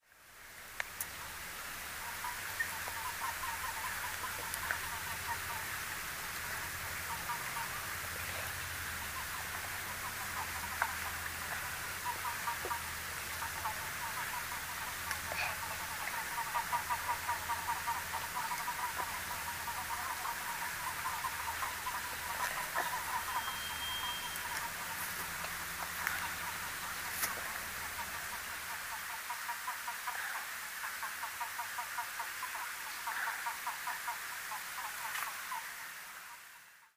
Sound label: western toads; north douglas